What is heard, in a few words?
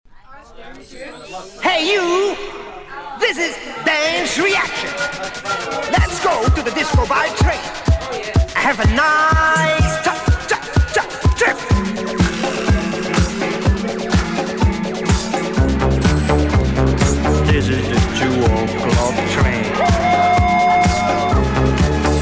Disco